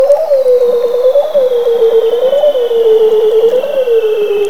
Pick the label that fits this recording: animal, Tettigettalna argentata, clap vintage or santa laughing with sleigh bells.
animal